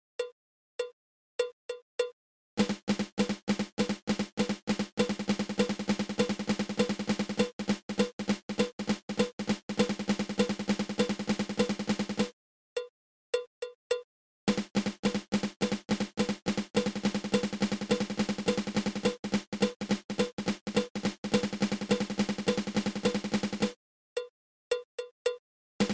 Swiss Army Triplet

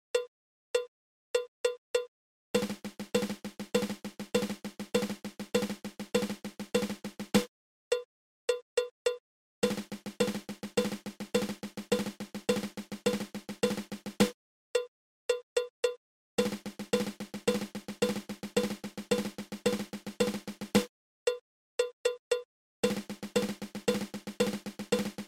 Single Dragadiddle

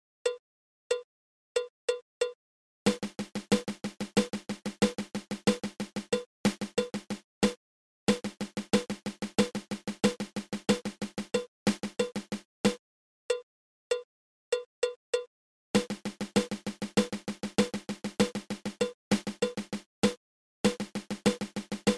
Single Paradiddle

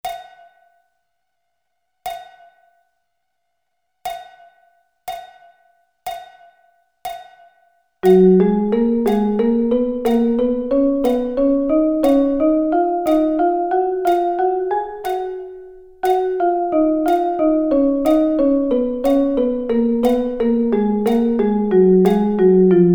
Double Sticking

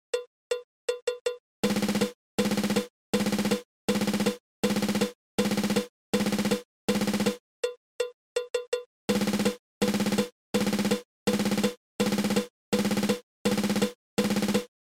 Single Stroke 7

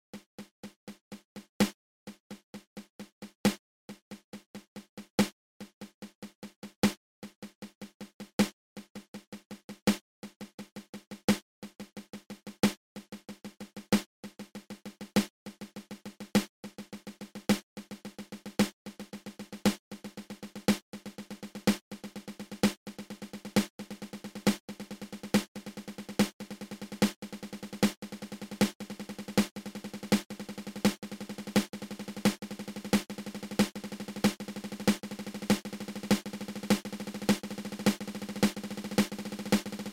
Seven Stroke Roll open close open

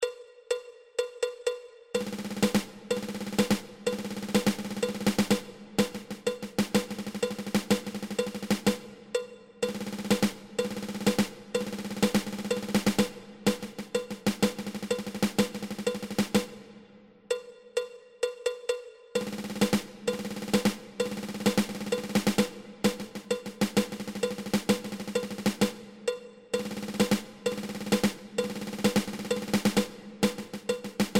Ten Stroke Roll

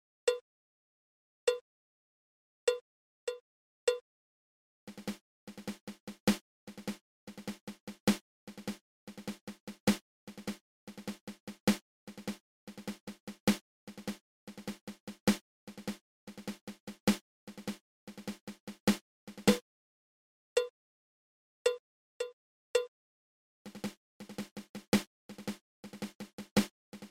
Double Ratamacue

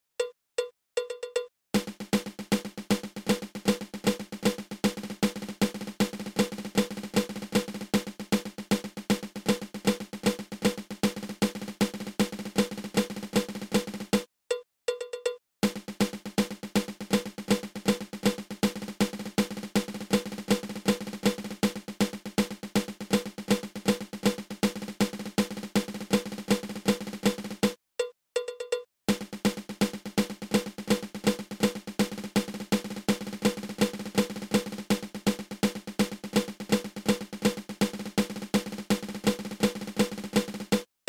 Flam Drag

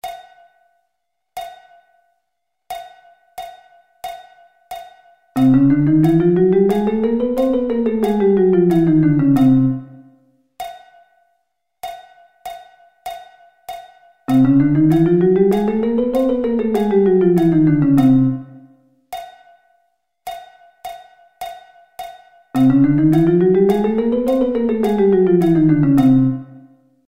Chromatic Scale

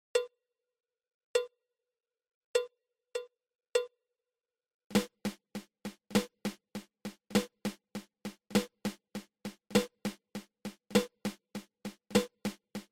Flammed Mill

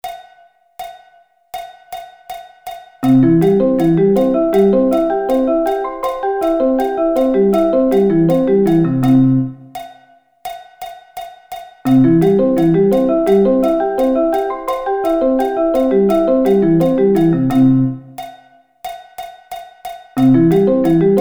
C Arpeggio Inversions

